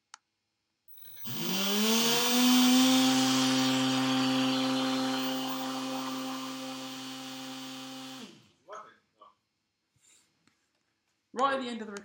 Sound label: run at 1500, multirotor